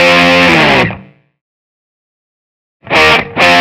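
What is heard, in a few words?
133 Pop Guitar Distortion F; Pop Rock; Electric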